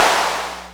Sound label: Clapbox Noise; Ableton Foundation FreePacks